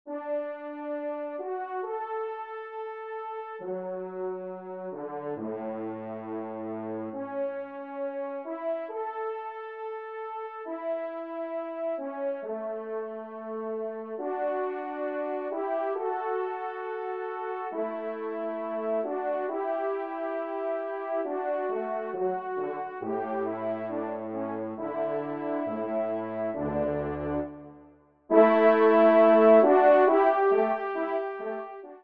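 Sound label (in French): duo, ensemble, trompe cors; Kling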